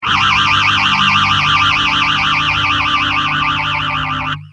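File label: guitar Systech phaser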